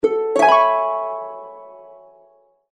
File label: Pluck Pause Notification, Harp; HTC; Harp 1